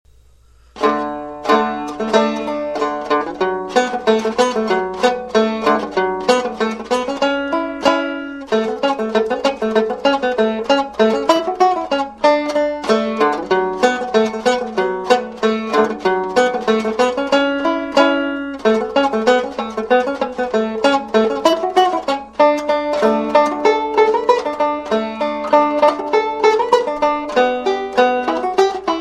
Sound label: ragtime; annie